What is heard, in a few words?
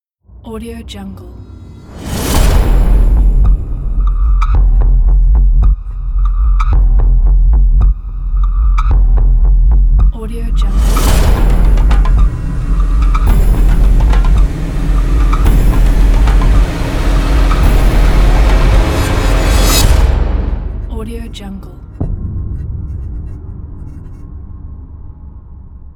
horror logo